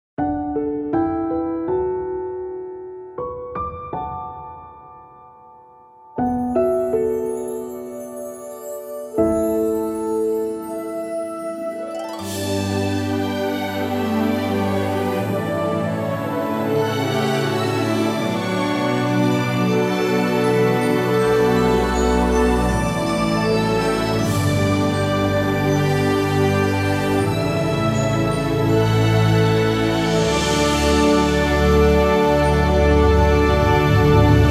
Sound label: TV Advertising Love Theme